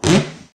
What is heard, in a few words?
weird, sfx